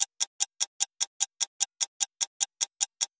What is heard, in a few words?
Clock Tick